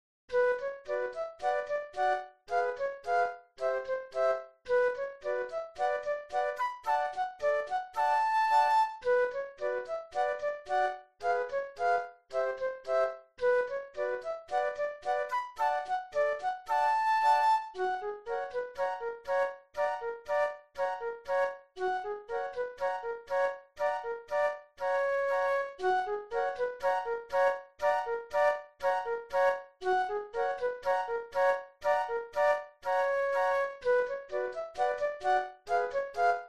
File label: Flute Trio; classical